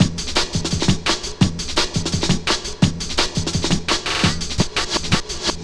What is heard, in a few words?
Sweet Beat, Drum & Bass; Fluid Dynamics